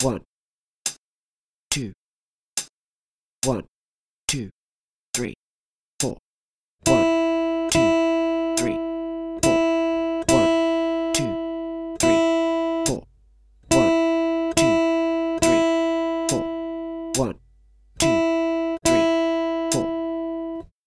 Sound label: Long Notes, guitar